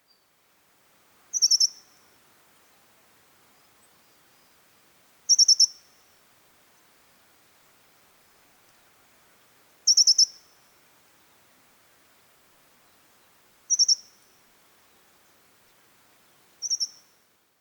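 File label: woodlark, tobishima; yamahibari; jinaki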